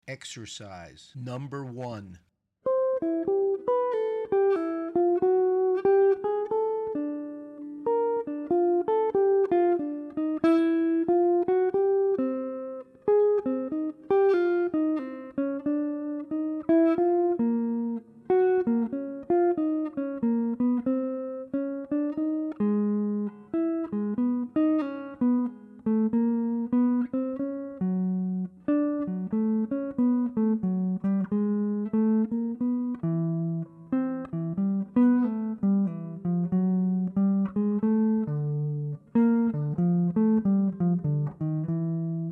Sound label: Cycle Of 4ths II V Exercises; lead skills materials